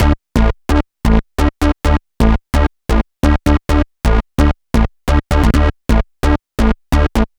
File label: Change Up Bass, EDM; Sonic Foundry (Sony Creative Software); Afterhours EDM